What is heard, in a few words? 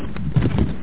Crump; Noddy`s Big Adventure